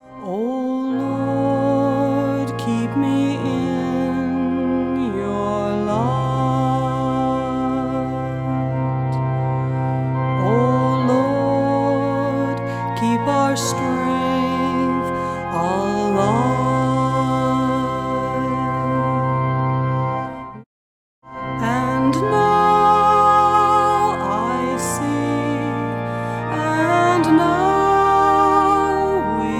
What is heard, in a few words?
organ